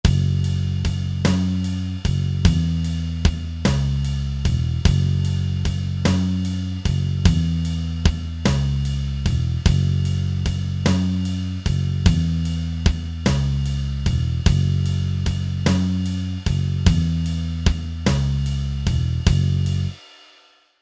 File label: Blues